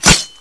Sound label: knife shoot